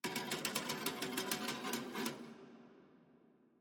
ambience room torture cage

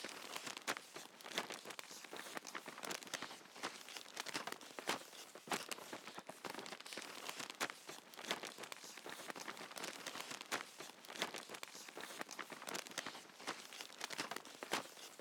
spell draw drawing books loop; Shelter

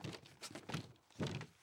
ambience room shadow room working layer

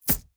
environment object drop moneybag

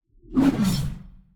morgenstern swing; player

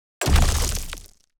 successful build

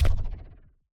Invisible Track Footstep; TANK; 3Souls

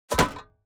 object grab metal

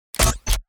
wrong button; TANK; 3Souls

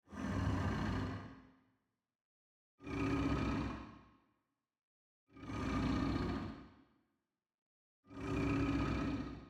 Bone Golem Idle; TANK; 3Souls

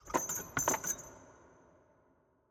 tripalium chain; ambience room torture

